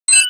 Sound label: weapon c4 beep impact; physics; weapons